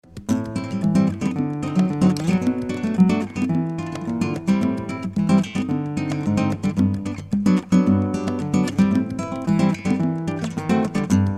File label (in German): Konzertgitarre Easypick Latin ohne EQ Stereo